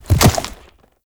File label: Enemy Horse Stomp; Duviri